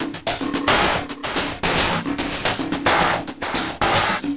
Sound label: Beat, Industrial